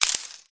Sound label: forest spirit wound